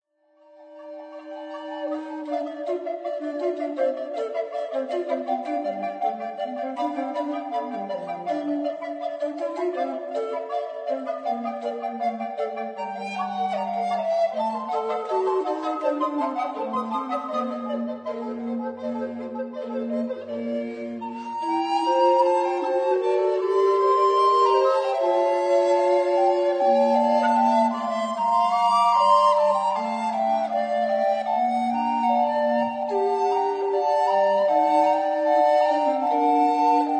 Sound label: Fugue